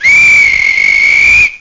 whistle; Take2 v1.7

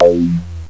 FM Bass; SynBass

